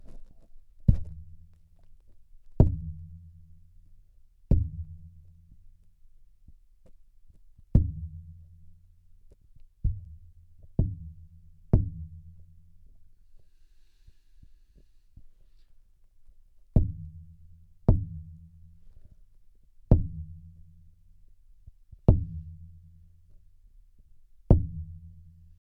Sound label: Thumping mat